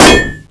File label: pipe hit